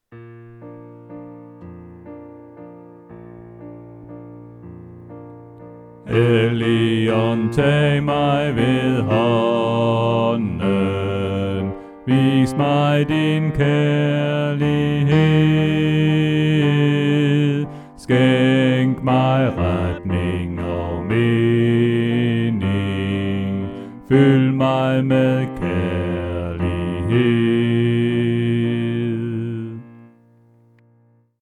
Helligånd tag mig ved hånden Bas; oevefiler

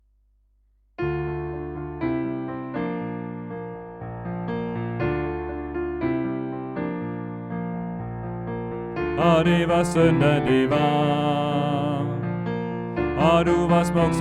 Eventyr for begyndere Bas; oevefiler

